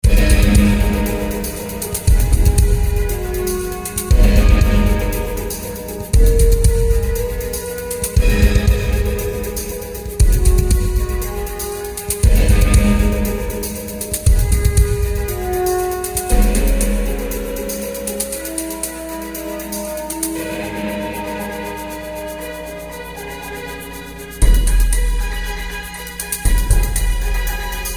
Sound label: fast, music; Soldier Of Fortune II Double Helix; XBOX; prague